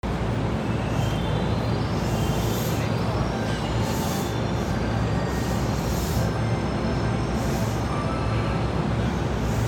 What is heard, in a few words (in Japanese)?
JR京浜東北線蕨南, train sounds